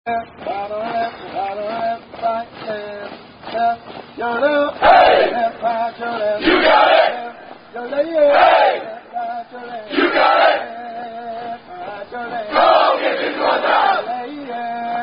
Cadence

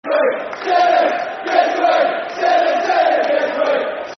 Football Chant (Soccer Songs); Sinnott Give Us A Wave; Port Vale Pvfc

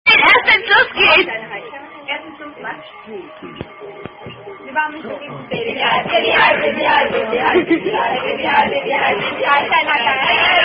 German Attack Song

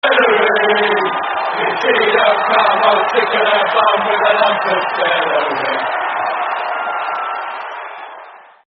No Cheer, terrace chants; Celery; Chelsea

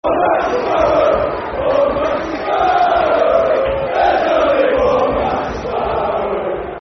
Football Chant (Soccer Songs); One Matty Sparrow; Scunthorpe United Scfc

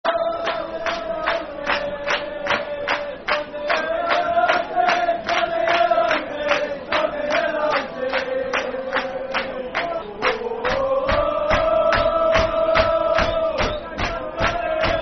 Football Chant; Woah Dundee United; Dundee United Dufc

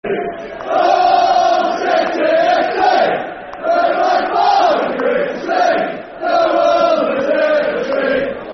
Football Chants (Soccer Songs); Hull City F.c.; Hull City Hcfc

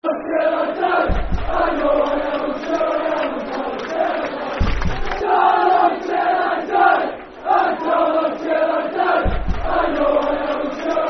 Football Chant, Darlington; Darlo Til I Die; Darlington Dfc

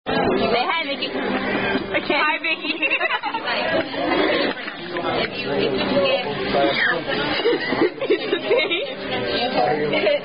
Happy Birthday (Standard) Sung By Wait Staff; Chili's

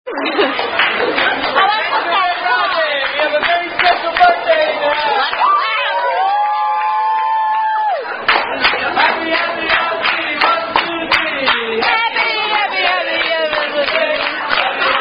Happy Happy Birthday; Red Robin

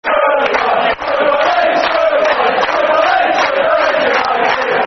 Super Leeds Football Chant (Soccer Song); fanchants; Leeds Lufc

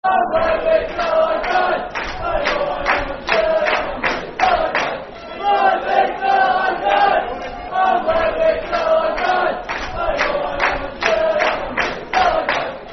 Football Chant, Soccer Song; Livi Til I Die; Livingston Lfc

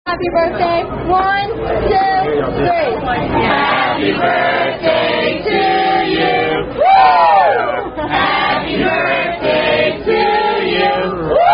Happy Birthday (Standard) Sung By Staff; Outback

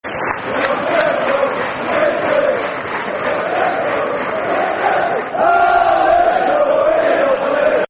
Football Chants (Soccer Songs), Liverpool; We Love You Liverpool; Liverpool Lfc

